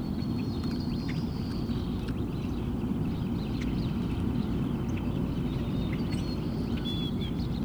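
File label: ambience bird; portland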